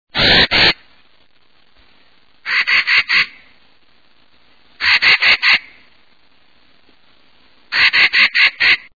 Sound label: Clarks Nutcracker